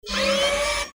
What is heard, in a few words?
servo noise